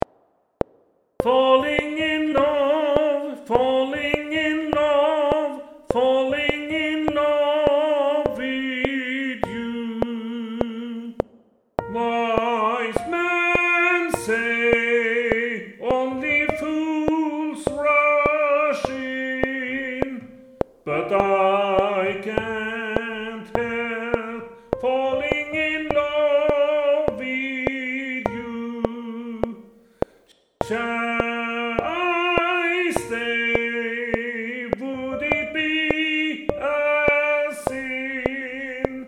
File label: Tenor 1